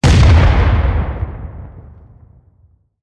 cannon; ghostship; kunkka

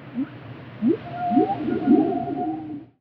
cetaceans; ambient soundscapes; dota

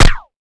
projectile impact; weapons

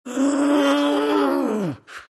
moans; Dota 2; Undying

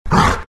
grunt, greevil; courier

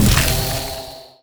swarm cast; weaver

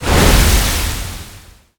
kraken shell; tidehunter

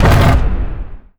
filter close, ui menu

